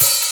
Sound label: OPHAT